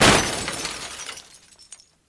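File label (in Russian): падение стеклянной посуды с диким грохотом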